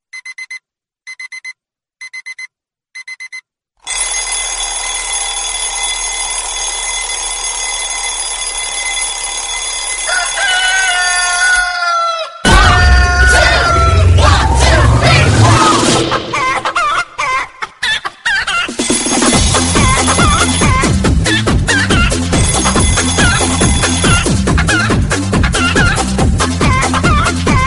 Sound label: crazy chicken alarm tone